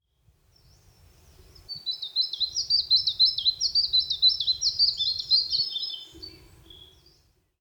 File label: song siberia, tristis